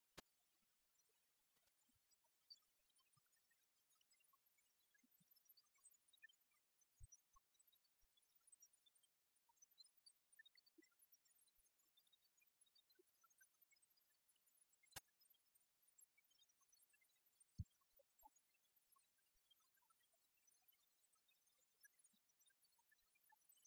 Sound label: lead vocal